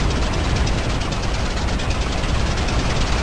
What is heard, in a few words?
lander engine idle; overlord